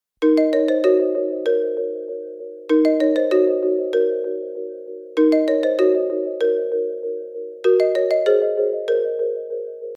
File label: tone